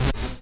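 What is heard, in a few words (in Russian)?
кнопка цифровой нисходящий резкий звонкий высокий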